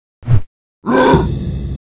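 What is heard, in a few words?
Mob attack; Monster; 묘족; 하문왕자; 2단계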